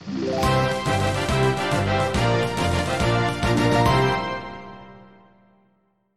Victory

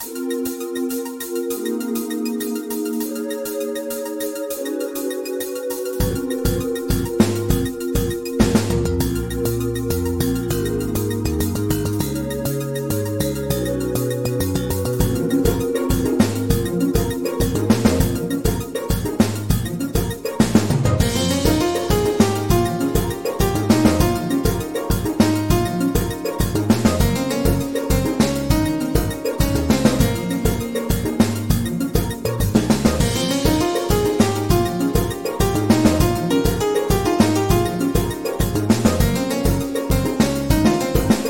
Dungeon, bgm; ycrpg

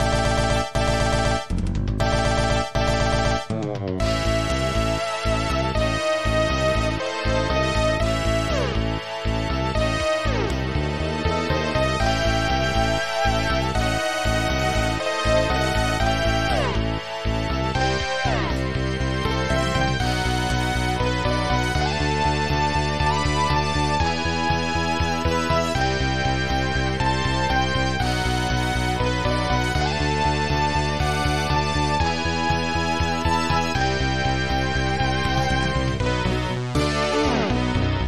Battle, bgm

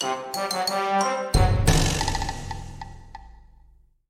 Gag; ycrpg

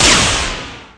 Thunder

